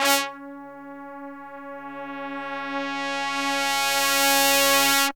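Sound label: brass sforzando; Zero-G; Phantom Horns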